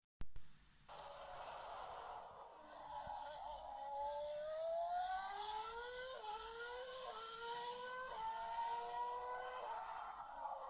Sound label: Formule1